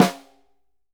SNR, Synclavier Percussion 2 - World & Orchestral

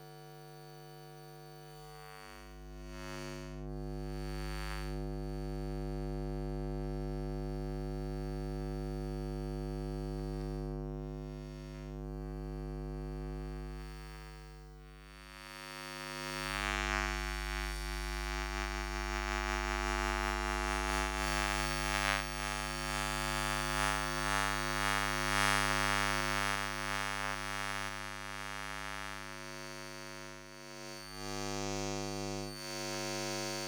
Electromagnetic Field, Electro; electric fields

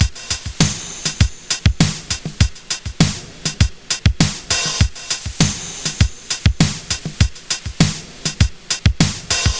100 Beat; Zero-G - Future Wave

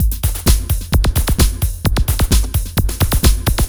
BINARY BEATS, Tekkno LOOPS 130; Masterbits - Tekkno Trax

